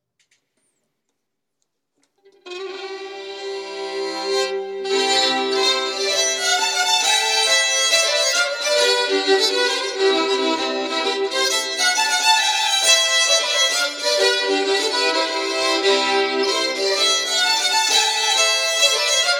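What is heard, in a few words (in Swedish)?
Polska, säckpipa; efter Moss Erker Mosstorpet Kväggeshyttan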